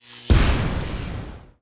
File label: Blown Transformer; Nova Sound; Explosive SuperNova